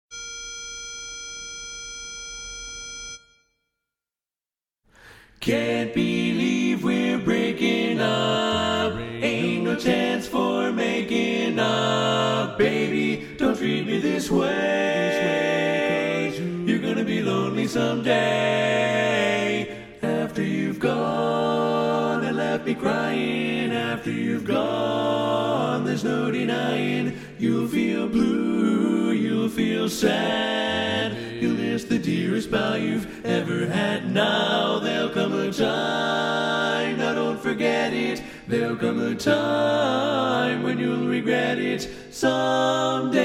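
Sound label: Baritone, Learning Tracks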